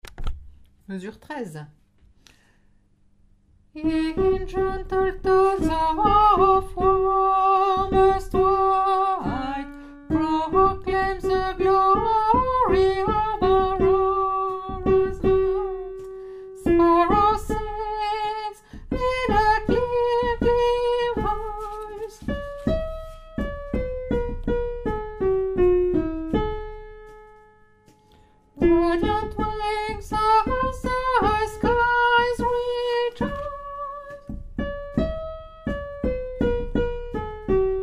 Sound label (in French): basse